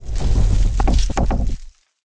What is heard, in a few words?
Elven Tree Idle